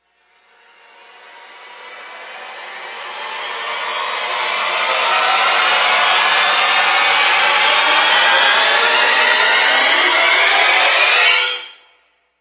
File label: Puncture Rise